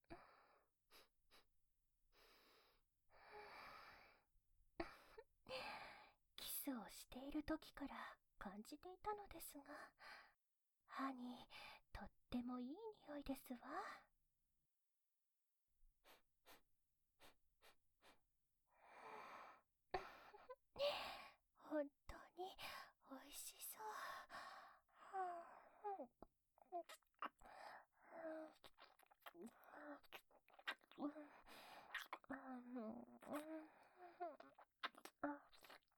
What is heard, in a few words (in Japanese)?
首筋リップと耳舐め; お嬢様と首筋リップと耳舐め